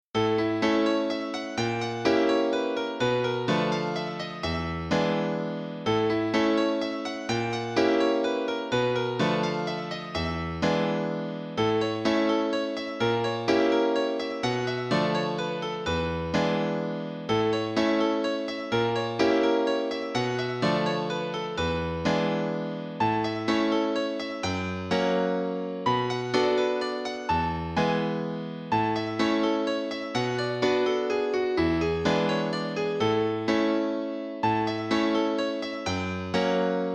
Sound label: Waltz D major